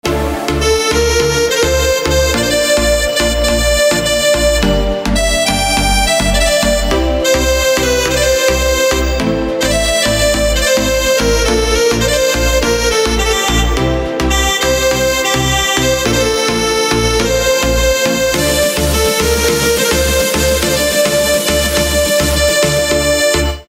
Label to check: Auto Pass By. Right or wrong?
Wrong